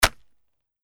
football shoulderpads hit

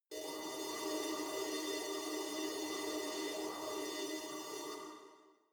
space alien fabric machine energized high

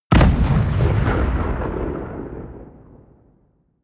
bomb fragmentation distant

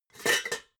pots clank soft

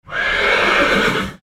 horse running off

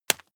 juggle catch hard

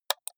computer mouse left click single hard

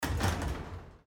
cable car door

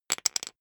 poker chip fall wobble short

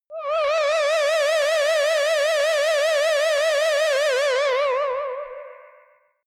ululation ufo

